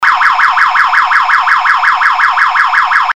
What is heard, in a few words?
WAILER